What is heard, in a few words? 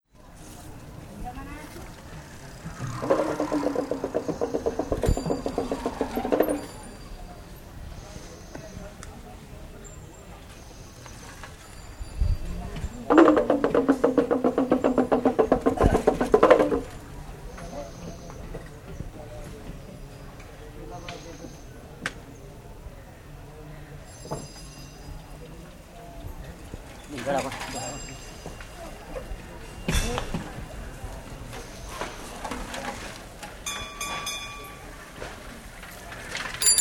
Village, India